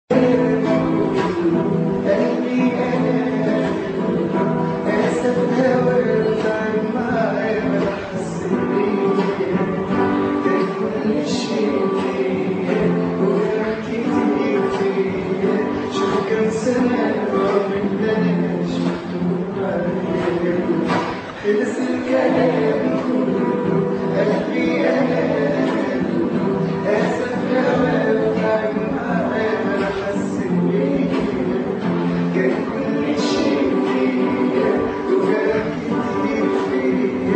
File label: guitar; egypt